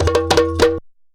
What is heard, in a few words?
sabar groove; Best Service; World Colours